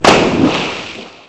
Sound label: Game, 游戏音效; 特酷声音素材库